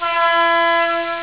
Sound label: Airhorn